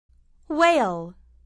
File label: whale